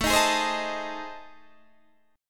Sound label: A Minor Major 7th Flat 5th, Guitar Open D